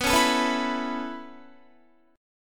B 7th Suspended 2nd Suspended 4th down Guitar, chord; B7sus2sus4; strummed